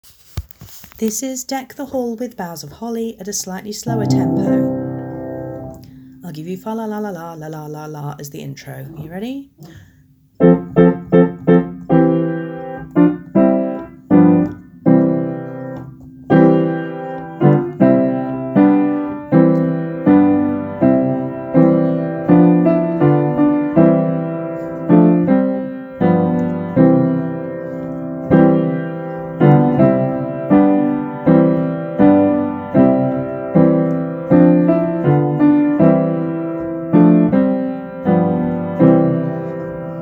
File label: deck the hall 80bpm, cello